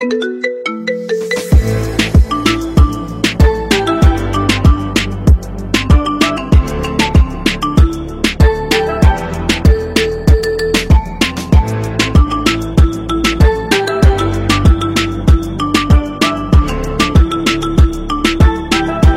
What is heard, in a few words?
Marimba Remix